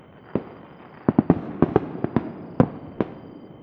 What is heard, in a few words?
firework